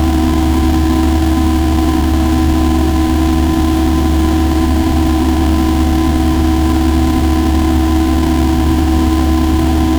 pixel art car engine idle, retro

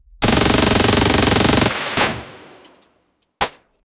realistic ak 47 reload

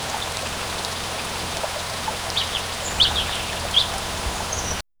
gentle rustling leaves, bird chirps

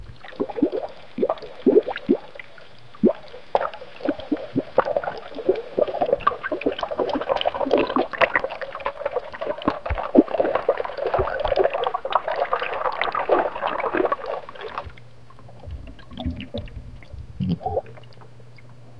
stomach gurgling and slos; belly

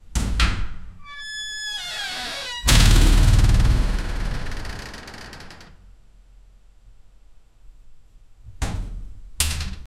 air

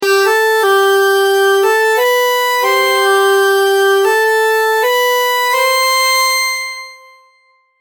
a positive score sound; sound effect

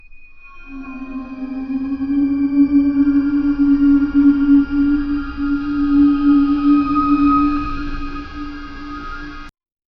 cold ambient horror with

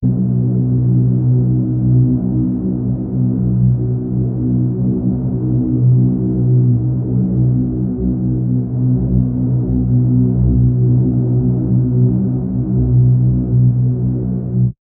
a bit dark fanfar, epic

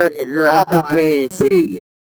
hysterical laughter of a, spider

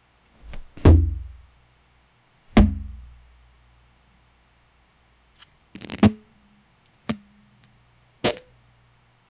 a harsh unpleasant impact, blunt

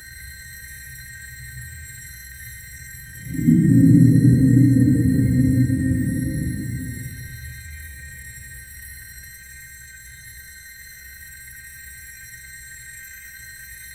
soft kitchen ambience; magical

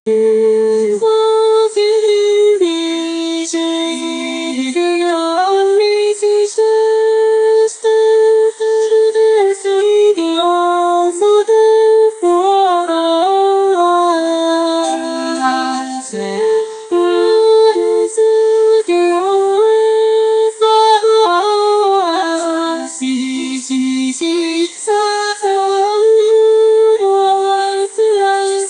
japan music singing

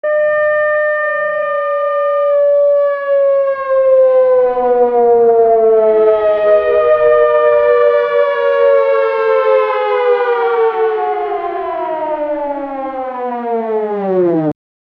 tornado air raid siren; alert

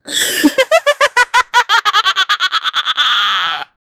evil witch laugh

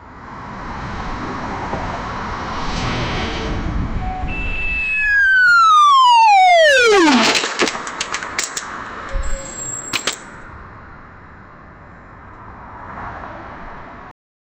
robot falling off a bridg, city

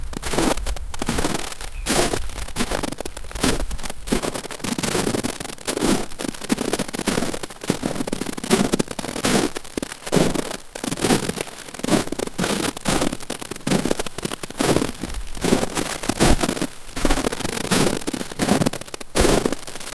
heavy snow storm, blizzard

